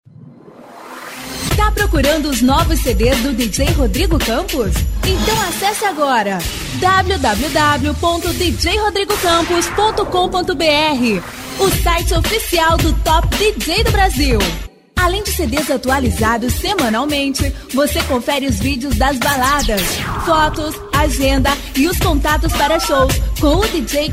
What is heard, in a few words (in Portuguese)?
Baterias, som automotivo; Baterias Agressive Power Vol.02; som automotivo raiz; Open Format